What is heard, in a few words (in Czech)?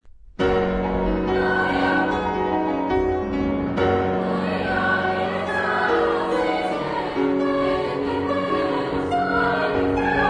Missa brevis Fis dur klavirni verze